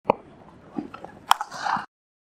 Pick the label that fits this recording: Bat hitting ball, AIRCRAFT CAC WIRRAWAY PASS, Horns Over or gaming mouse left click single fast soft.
Bat hitting ball